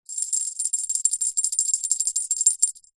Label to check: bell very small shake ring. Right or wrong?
Right